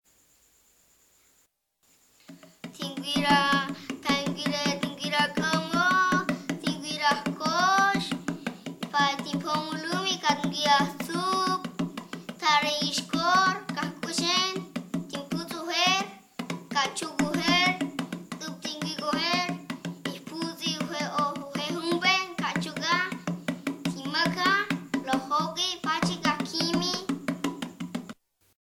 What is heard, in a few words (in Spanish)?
lacandones canto de los animales